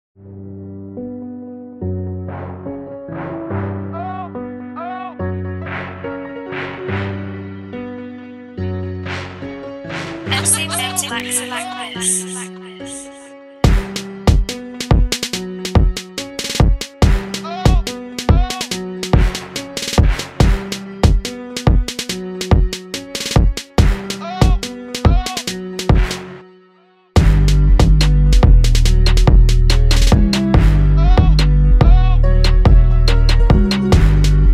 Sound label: Instrumental